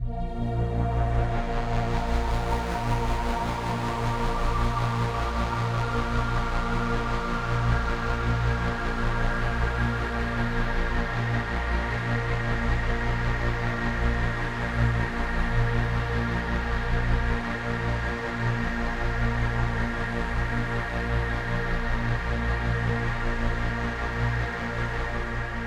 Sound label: Overlay Drone; The Shard